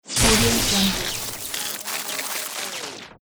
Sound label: lighting spark magic